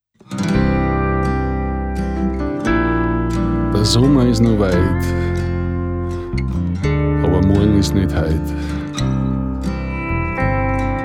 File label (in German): de alt walzer